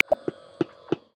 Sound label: Weddell Seal, Polar Region; Xiaomi Redmi-8A